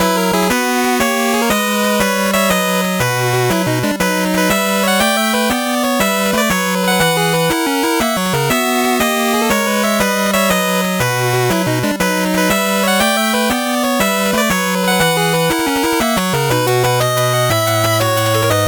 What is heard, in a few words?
8bit bgm normal